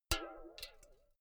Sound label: filled can hit ringing dripping long